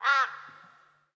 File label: Vulture